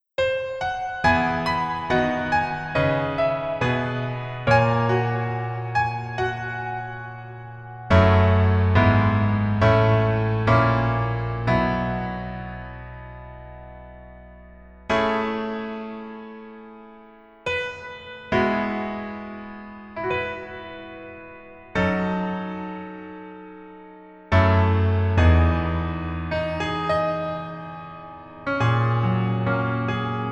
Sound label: Piano ver